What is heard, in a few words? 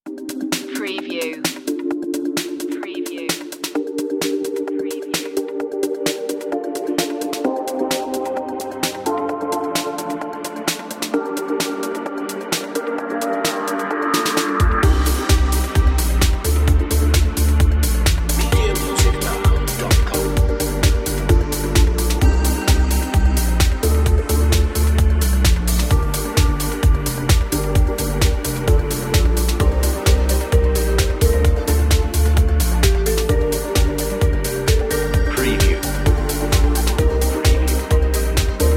emotional dance